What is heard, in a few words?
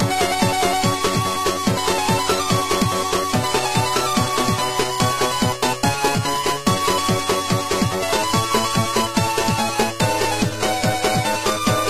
car radio; DELTARUNE